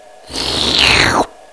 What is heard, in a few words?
chiup long